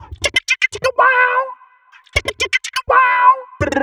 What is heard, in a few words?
Vox Glitches; Sonic Foundry (Sony Creative Software); Underground UK House & Electro